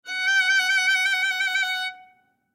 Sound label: Violin Trill, Violin Trill Short; Strings; Blastwave